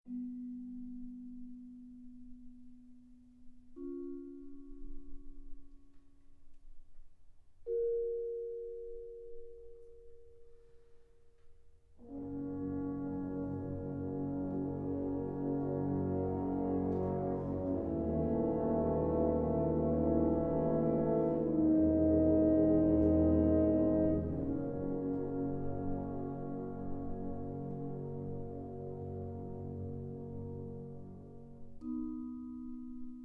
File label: concerto for percussion